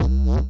flarz bass, Synth

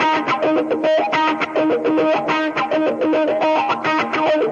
wah guitar; guitar loops

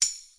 tambourine

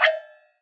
Fracture Perc, Found Percussion Hits; UNUSUAL KITS and FX; ModeAudio

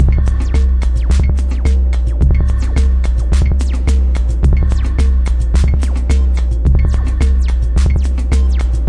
108 bpm tinbox 808 rhythm

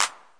bright clap; Claps

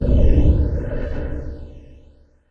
dream echo; Various Strange Sounds and Effects

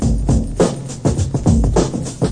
102 bpm aqua loop, drum loops and breaks

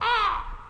silly elec fx

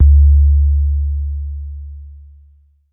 thin 808 sub, Jungle Bass

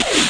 lofi sega sample; 8 BIT; Lofi Sega Samples

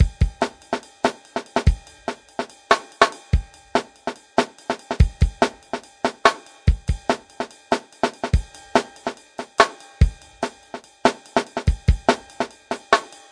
144 bpm snare ride love affair; 141 to 160 bpm

